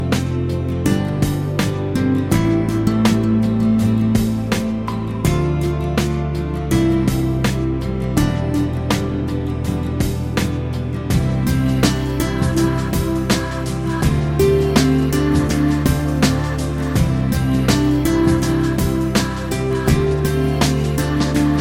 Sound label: Ocean Cave Guitar